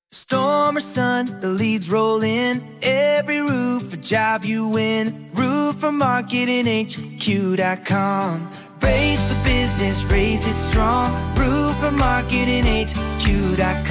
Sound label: jingle